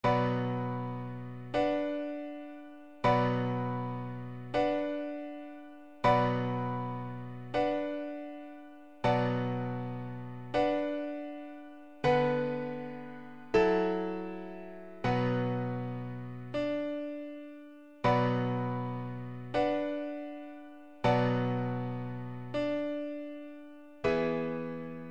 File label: Oboe; Holst Song without words